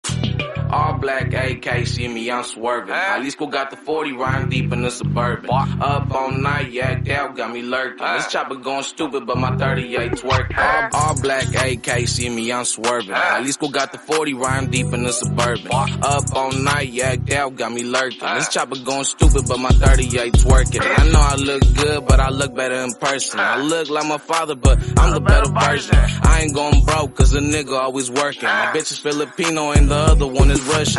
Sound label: baby rap